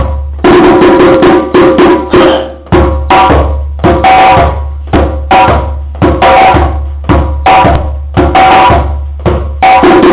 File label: Soko Solo